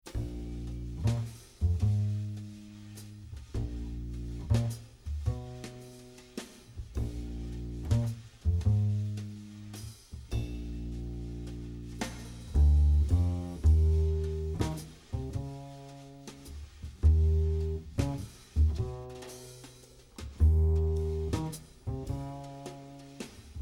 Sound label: walking bass